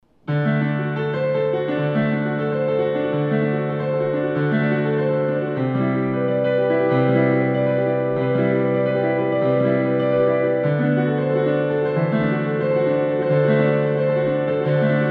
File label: 12 equal